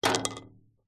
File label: stone pebble drop against metal tank and land on concrete slab